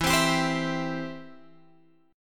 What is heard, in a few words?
E Minor Sharp 5th E down Guitar Drop D